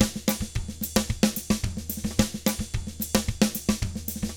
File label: SMBA, 110 SAMBA, Drums